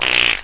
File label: toot